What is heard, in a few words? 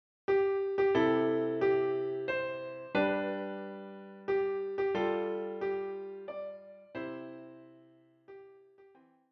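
piano four hands; Happy Birthday to you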